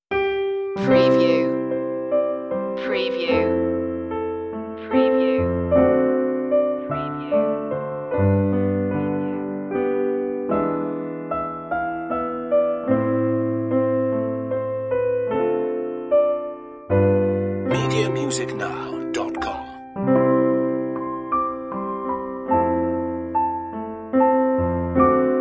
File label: solo piano; we wish you a merry christmas